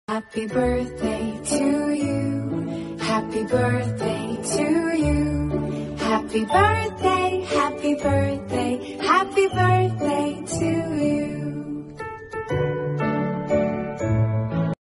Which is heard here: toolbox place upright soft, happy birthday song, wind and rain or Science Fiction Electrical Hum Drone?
happy birthday song